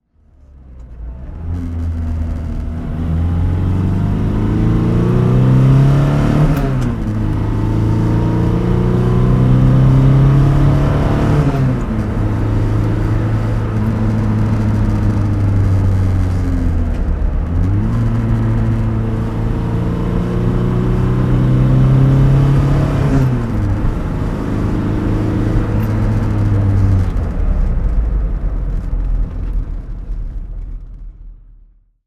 lotus elan sprint coupe driving, Engine sound driving; Lotus Elan 'Sprint' coupe, 1971; V3D/ ClassicarImages; Marc Vorgers